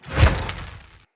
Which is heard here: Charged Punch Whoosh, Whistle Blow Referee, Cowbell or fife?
Charged Punch Whoosh